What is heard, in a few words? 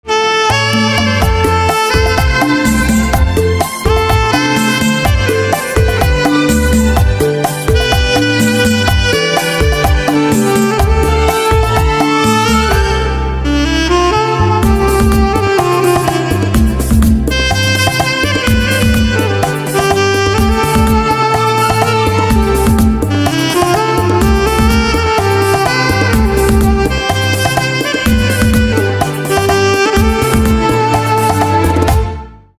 Saxophone